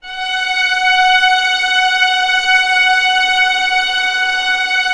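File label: STRINGS F#4 FF, Orchestra; Sound On Sound - Peter Siedleczek's Orchestra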